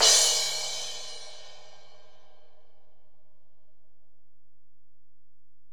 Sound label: Ride, Alesis DM-PRO